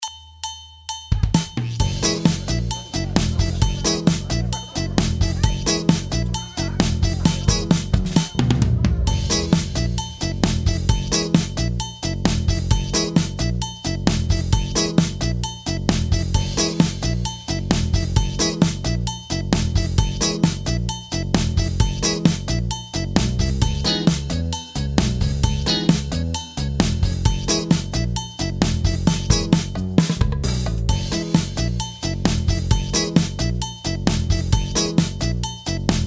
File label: backing